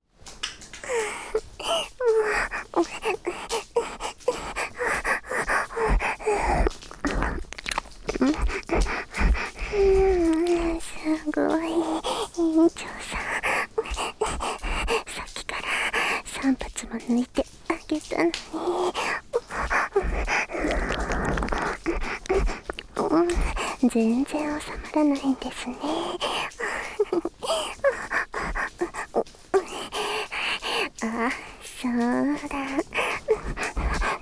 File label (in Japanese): 事後のエンドレスえっちボイス 喘ぎ声; (10)[Exトラック]あいなと事後のエンドレスえっちボイス…♪～淫らな彼女（？）のエロすぎる喘ぎ声をねっとり堪能…♪～